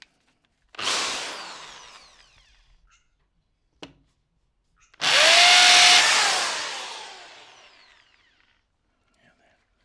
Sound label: Drill